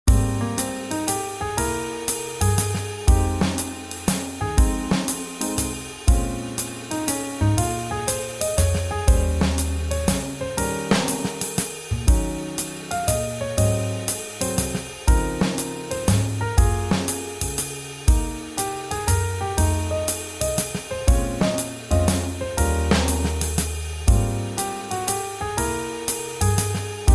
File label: Swing Jazz